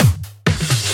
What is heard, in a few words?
Fills 128BPM, Clubsounds